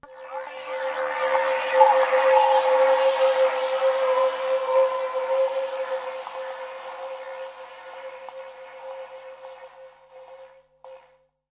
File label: artificial intelligence blurbles; Atmospherics